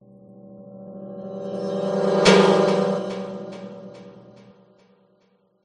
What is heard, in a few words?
transition passby